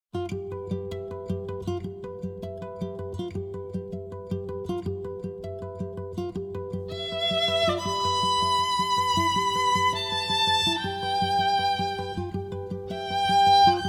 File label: Cave; Pokemon Masters EX